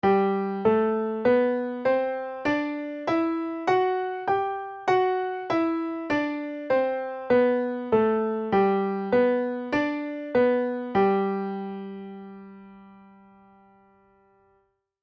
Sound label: tune up G major